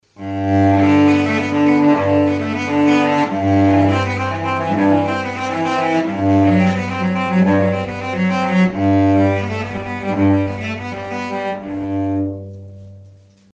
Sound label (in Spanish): violon chelo